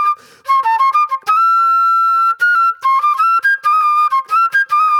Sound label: Flute